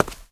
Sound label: ladder step; wob2 demo